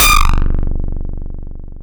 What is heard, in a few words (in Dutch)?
Metalen schop, FM; Polyend